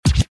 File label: menu click; o!mania v3 (Bars)